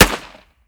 45 ACP 1911 Pistol Gunshot; Weapons; Gunshots

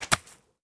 Rough, Golf; Foley Sports

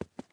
footsteps tennis shoes walk; Player Character SFX

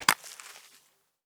Sand Trap, Golf; Foley Sports

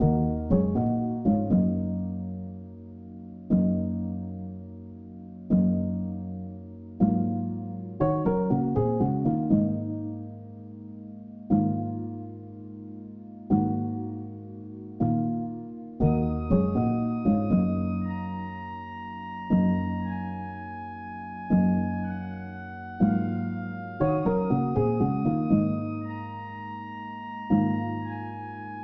Lo-Fi Phat & Lazy Intensity 1

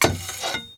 sliding metal noise; Enemy SFX

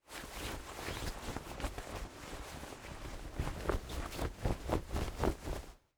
cloth rustle jacket

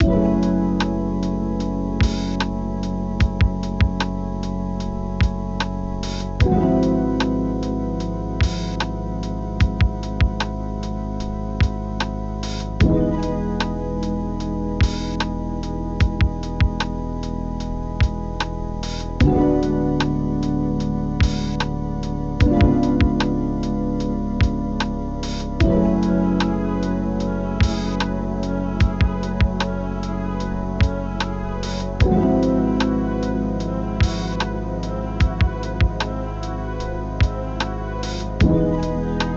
Lo-Fi Wicker Main

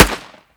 45 ACP 1911 Pistol Gunshot; Weapons; Gunshots

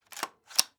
gun m 16 reload full clip magazine; Weapons

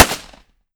45 ACP 1911 Pistol Gunshot; Weapons; Gunshots

